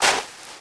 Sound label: Walk Dirt; Player; Footsteps